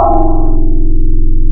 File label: PPG, Classic Synths wavetables